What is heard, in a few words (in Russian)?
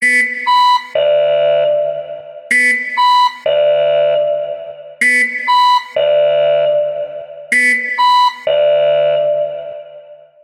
prikolnyj zvuk na budilnik alarm sound